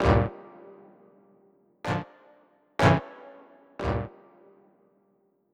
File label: SYNTH 120bpm